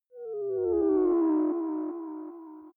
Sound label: whale calling